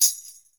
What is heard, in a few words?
TAM LEFT, NYC Percussionworks; Sampleheads; 90 s Sample CDs